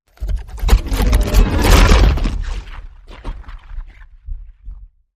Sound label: Flesh Hit